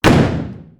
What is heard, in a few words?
shotgun fire